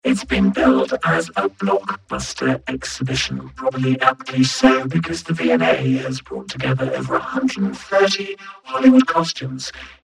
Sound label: vocoder